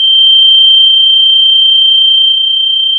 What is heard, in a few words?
Sound of a tea kettle whistling